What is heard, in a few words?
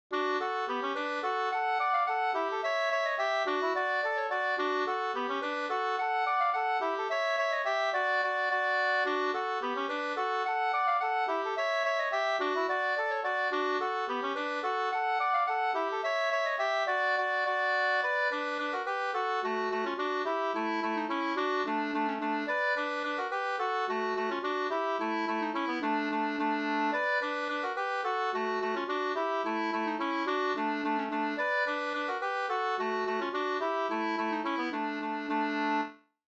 polka